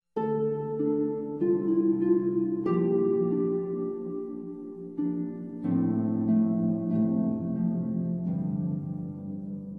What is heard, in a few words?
npc music; skyrim; harvest dawn